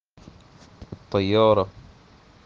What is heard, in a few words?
airport